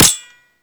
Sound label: Anti Aircraft Gun Mech; Weapons; Warframe; Archwing